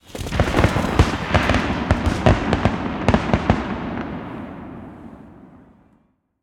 fireworks; vegas night life

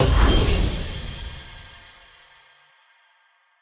free Spin Outro Cont Clicked; riches of midgard

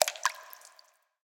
gem Splash; imperialriches

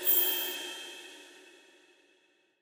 mystery sym anim end; goldengrimoire

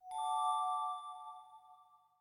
bling; imperialriches

